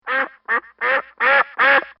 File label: Duck Quacking; Ducks; Animals